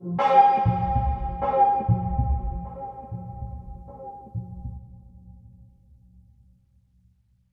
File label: String Mystery; Inquisitor 3